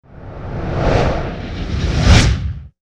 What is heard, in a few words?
Reverse Wind; Title Sequence Effects; Sony Pictures Sound Effects Series